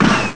CANNON

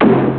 hit wood object

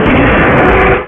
scrape

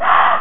flee

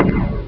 CHAINGUN

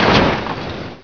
IMPACT

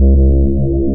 Hum Pulse

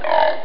eat

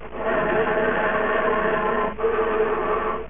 MONSTER

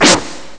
rotate; wb-games; protris1.1

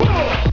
HOUGH

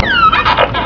HURT; ROBOWARS

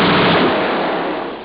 gun

